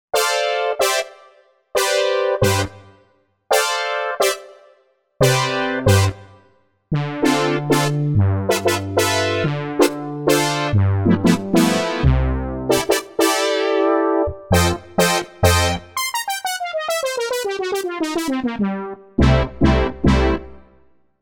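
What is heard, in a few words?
Pulse Matrix, synth brass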